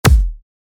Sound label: dubstep